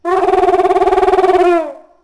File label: Call, Dino; Ourano